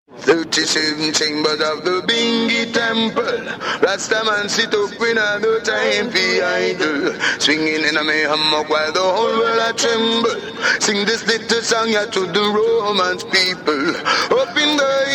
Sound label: jingle